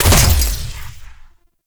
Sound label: Mech Jump; Nechro Mech; Vehicles